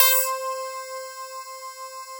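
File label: clavinet C3; CLAVNUTZ; Synth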